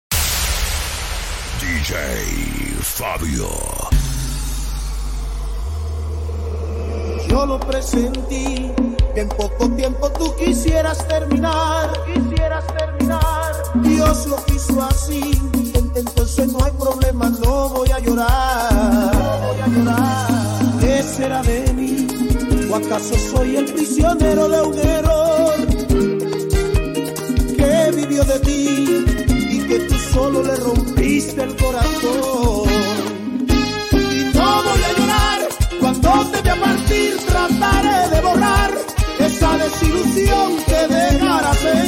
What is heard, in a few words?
MERENGUE VS TIPICO MIX